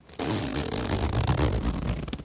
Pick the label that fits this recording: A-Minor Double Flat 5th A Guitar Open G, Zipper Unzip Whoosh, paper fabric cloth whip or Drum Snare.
Zipper Unzip Whoosh